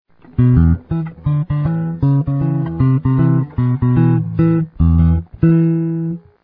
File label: wind